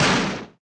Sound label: Ice